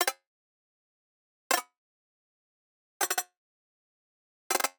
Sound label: Generic Click Digital